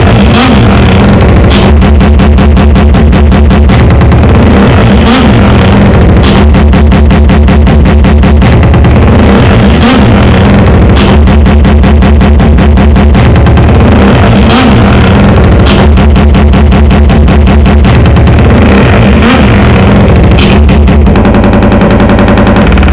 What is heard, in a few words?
strobes clicks and cuts; grischa lichtenberger at chromatologies